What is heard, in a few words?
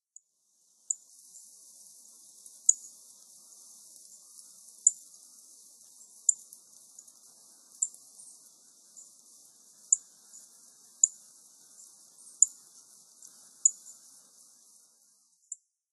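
Rustic Bunting, watarase; kashiradaka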